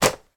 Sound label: newspaper folded drop on floor; sound effects one